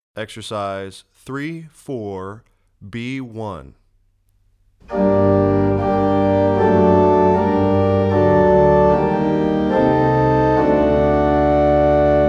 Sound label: exercise 3-4